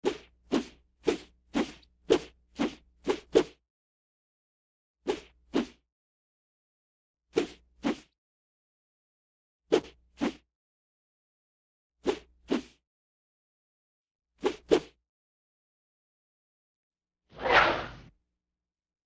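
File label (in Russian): карате взмахи руками и ногами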